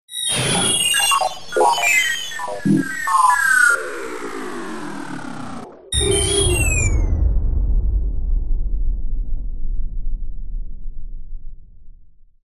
science fiction robot failure glitch shutdown

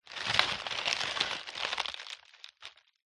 science fiction alien egg hatch

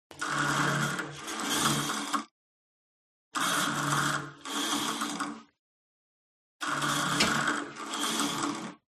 GYM Cable Machine Old Pull Release Fast; SPORT; smartsound

